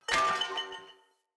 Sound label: Corrupted Battle Dog Bell Tail Wag Foley Fill